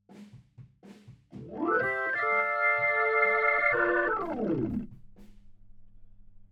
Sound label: HAMMOND